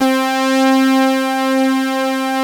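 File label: PPG synth brass, PPG Wave 1; Greytsounds VOL-1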